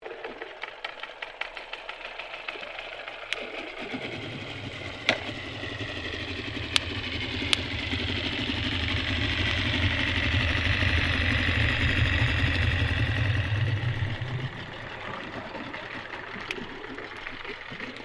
Boat Motor Outboard Unknown Start Move Off Submerged